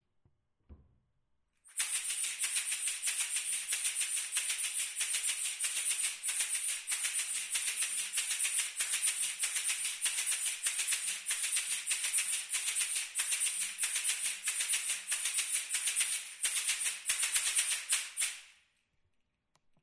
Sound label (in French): roca; Rocca